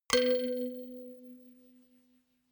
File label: notification kalimba rattle octave low